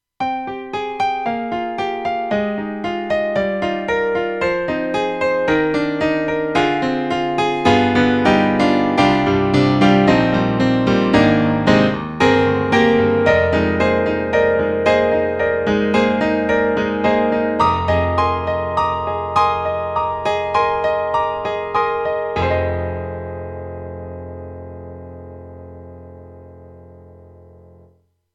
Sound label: piano, KORG 05RW